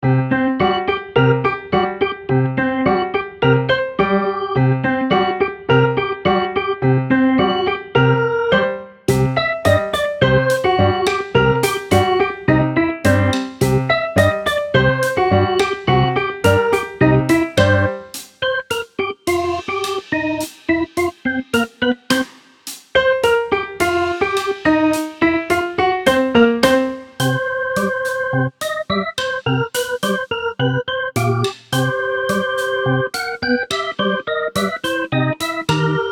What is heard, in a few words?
blues; shippai tantei